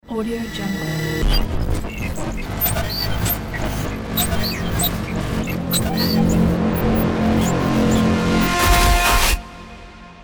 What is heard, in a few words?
cinematic glitches